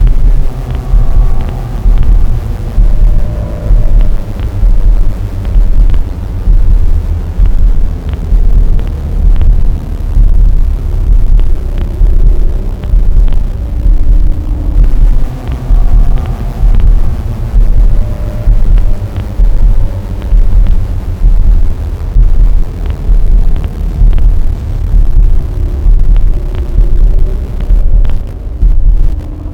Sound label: Ambient Run Alt; Game rip; MiSide